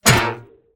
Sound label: error